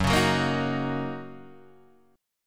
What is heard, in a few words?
Fsharp 6th down Guitar Open D